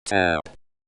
tap

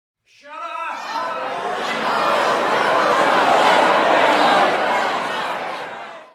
jeer; shuddup, deer…

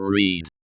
reed

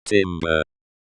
timbre kit

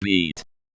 beat

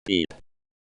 pipe

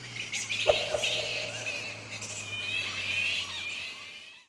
bats; the sound of whiffing echoes in the distance

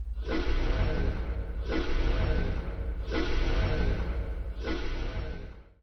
breathing

